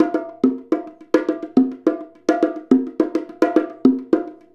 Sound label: Bongo; Essential Percussion